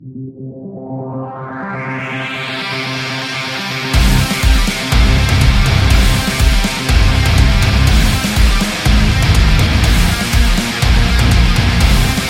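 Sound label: Metalized, Powerful metal background music built on heavy guitars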